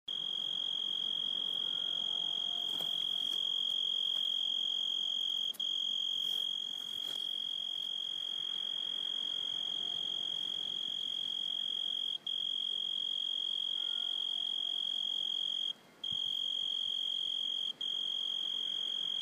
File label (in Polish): Cykady Z Madery